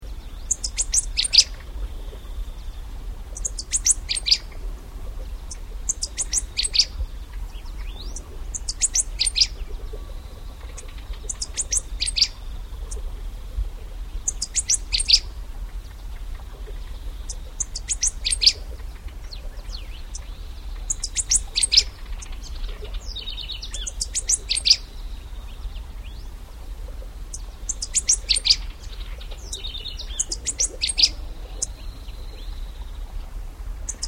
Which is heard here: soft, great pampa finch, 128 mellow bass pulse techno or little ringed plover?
great pampa finch